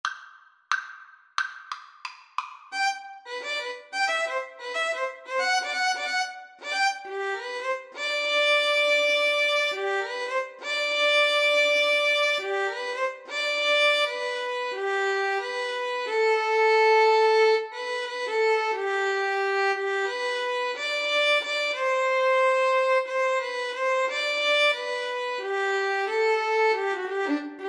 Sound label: violin duet